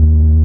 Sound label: sine; superstriker